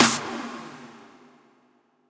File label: Snare, PsyTrance